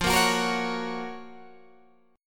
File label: Gb Major F; Listen to Gb/F strummed